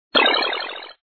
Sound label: arrow; star catcher